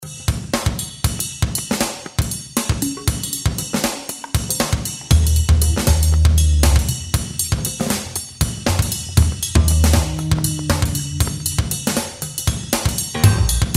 JAZZ FUSION

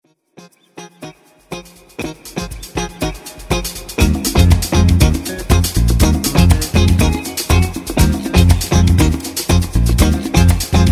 FUNKY CAMEROUN BRASS

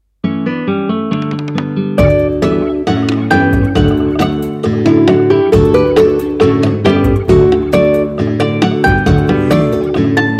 ACCOUSTIC STORY

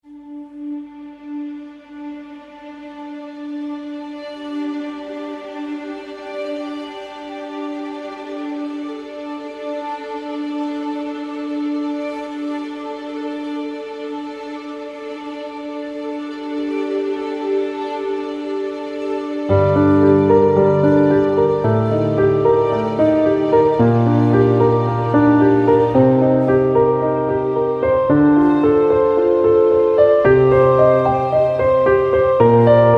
PIANO WAVES

